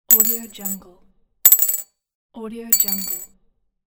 single coins

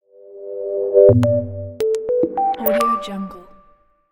bubbles creative logo

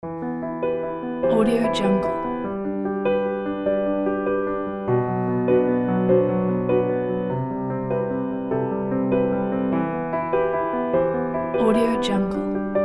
arpeggio piano

